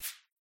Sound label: board highlight